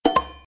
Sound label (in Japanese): koke; ゆめ2っき